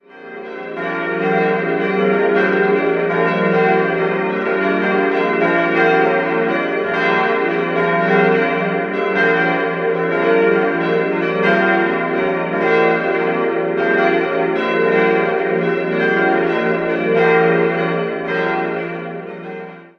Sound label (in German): Nürnberg-Kettelersiedlung, Pfarrkirche St. Rupert, glocken; Jahr 1954 von Friedrich Wilhelm Schilling, die beiden großen 1985 von der Karlsruher Glockengießerei gegossen.